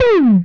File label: Sci Fi Gun, Blast; Space Blasters2D